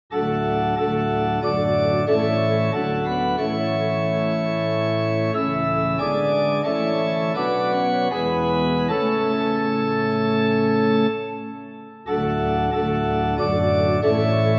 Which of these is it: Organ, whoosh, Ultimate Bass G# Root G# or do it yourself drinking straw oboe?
Organ